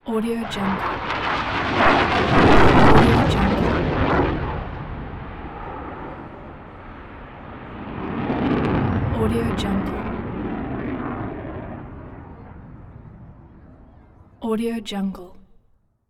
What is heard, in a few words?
jet fighter plane flying by; mediartsounds